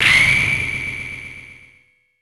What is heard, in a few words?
Home Run Bat Hit; Super Smash Bros